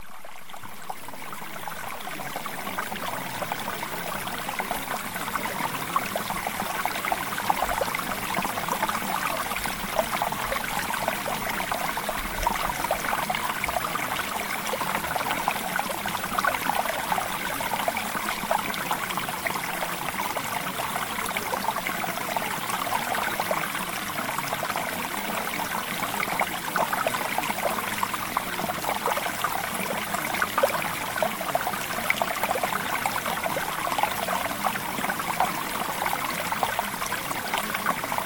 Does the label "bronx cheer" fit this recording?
No